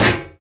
physics turret gibs

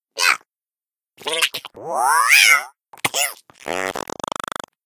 egg self spin

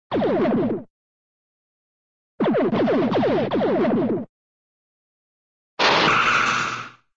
sci fi space gun; cartoon

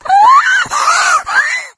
death, infected female

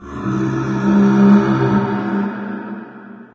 inside battle zombie, prison; ambient; source engine; hl2; video games

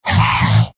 pain sharp; heavy; source engine; tf; mvm

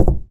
ceiling tile step, physics plaster

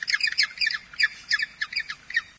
coast bird; ambient; source engine; hl2; video games

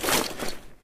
taunt jackhammer down movement; video games; source engine; tf

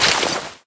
wind up, weapons; tomislav; tf

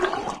toxic slime gurgle; ambient; canals; source engine; hl2; video games

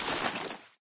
button click release; Source Engine; cstrike

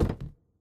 phys cube, physics

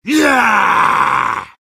battle cry; sniper; source engine; tf

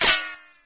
hit metal; source engine; dod

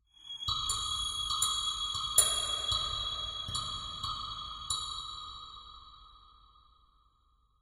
ambient synth grain click

